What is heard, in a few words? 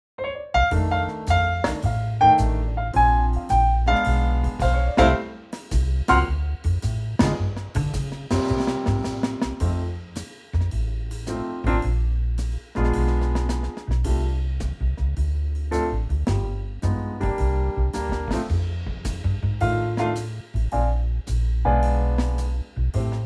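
Trio F, Backing Tracks for Male Vocals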